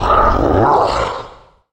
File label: Roar; SFX SPELLS Se Roar2